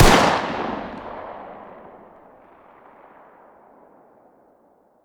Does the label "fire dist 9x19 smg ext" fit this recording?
Yes